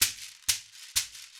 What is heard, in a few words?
South American Shekere Intro, Percussion; World